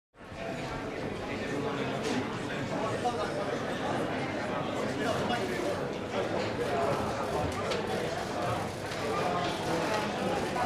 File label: Hotel Reception Hote, lobbys; Hotel Reception Area. Busy Chatter.